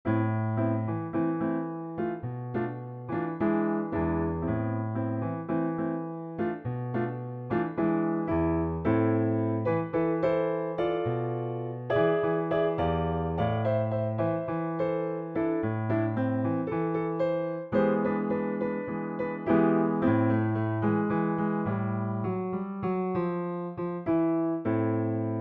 Bossa; Solvejg

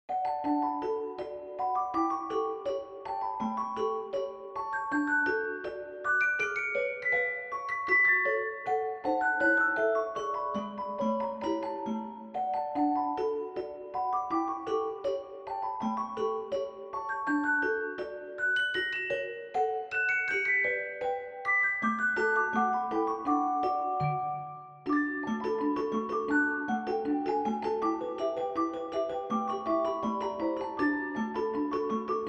with celeste; Dance of the hours

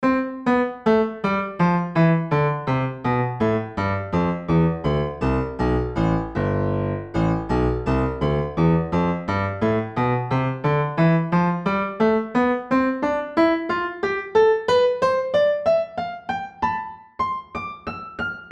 pianoteq woof

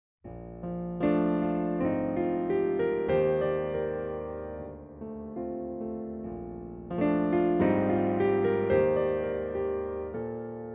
Baldwin Grand

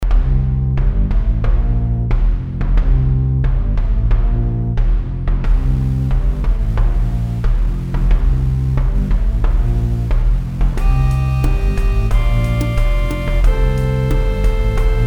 Distortion Fun

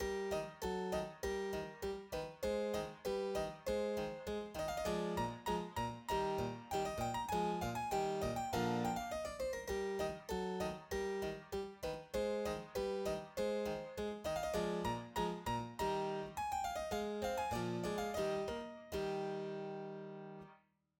Closed Lid Narrow Stereo Giusti

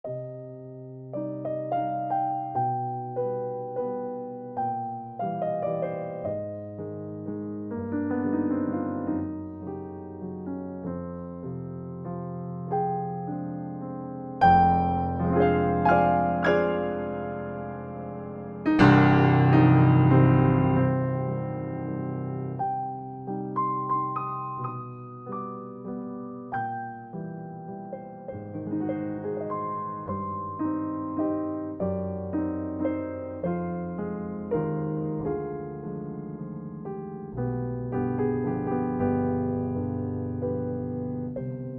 Steinway B; FOR YAMAHA N1X WITH INTERNAL CURVE1

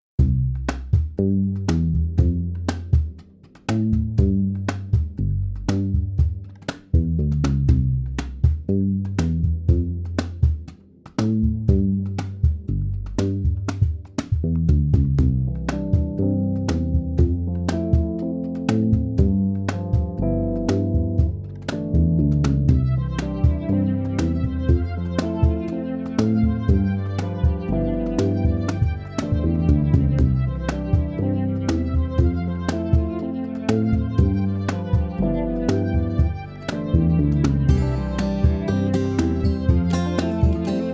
Synth Tests

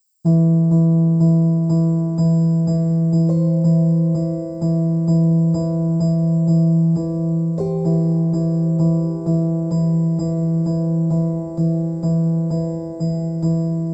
D4 Cinematic metallic noise boosted; Pianoteq V4

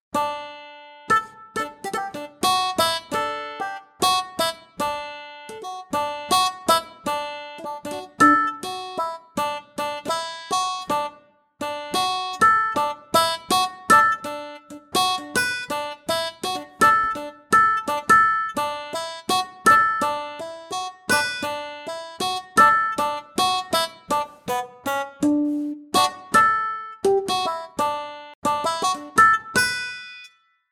Banjo

